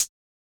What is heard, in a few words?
Percs